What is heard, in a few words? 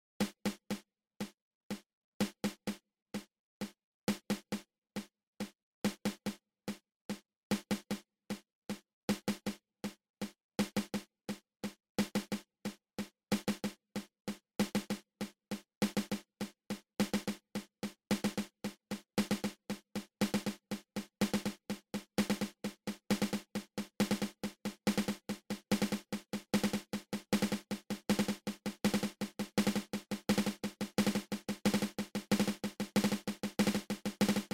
Single Dragadiddle open close open